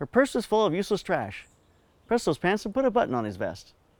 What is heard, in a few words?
Outside